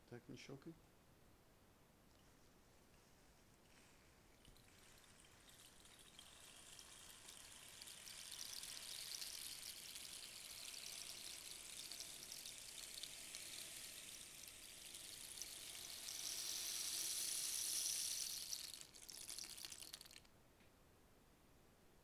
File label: Rain Stick